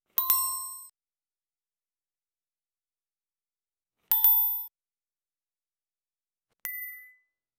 mini pick up coin sound; interface

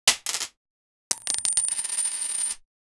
money sack fall to the; celebrations

